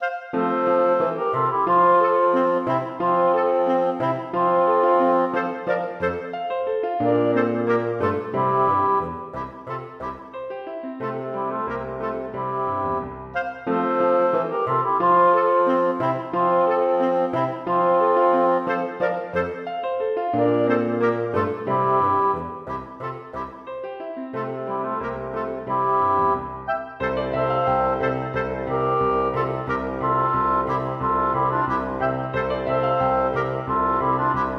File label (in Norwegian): Rigadon for to klarinetter, fagott og piano